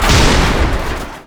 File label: Enemy Pirate Fall